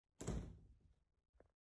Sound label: Close door